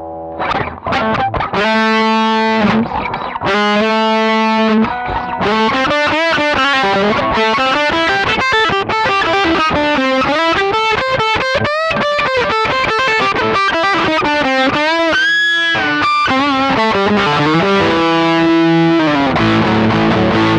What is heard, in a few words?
disto